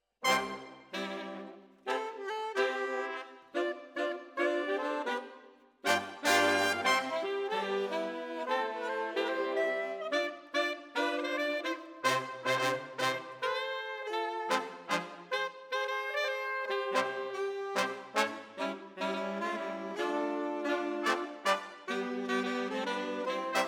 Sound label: Brass